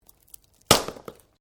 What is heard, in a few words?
Branches Dead Snap